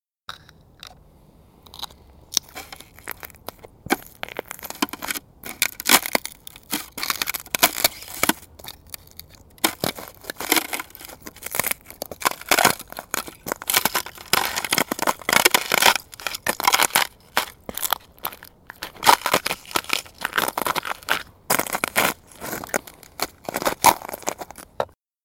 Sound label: thin ice, Walking on a frozen pool of water; Recorded december 2004 in Sweden.